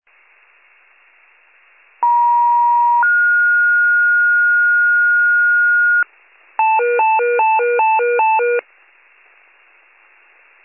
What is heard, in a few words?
FIRE pager alert